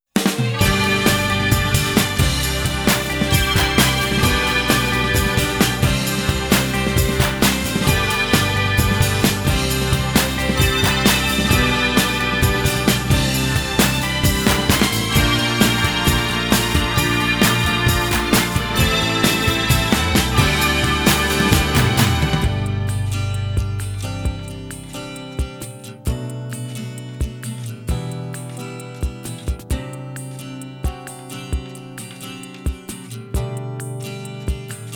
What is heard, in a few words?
Karaoke; Memories Off Complete Box